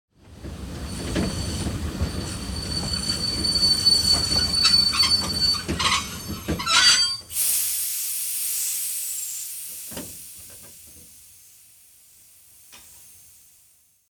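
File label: train braking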